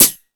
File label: High Hat, Drum Machines